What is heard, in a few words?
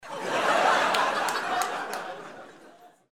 laugh